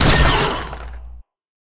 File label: Spider Kick; Game rip; MiSide